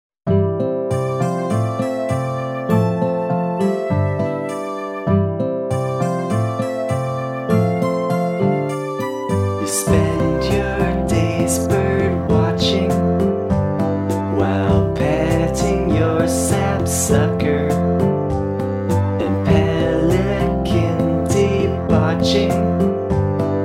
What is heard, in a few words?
rap